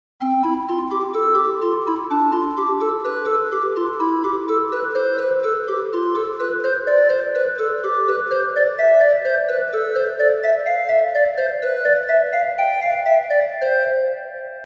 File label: dynamic organ Gedact HANON